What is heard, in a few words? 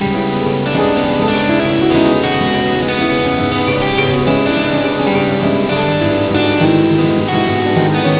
music mix